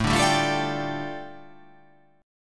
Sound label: A 7th Suspended 4th Sharp 5th, A, down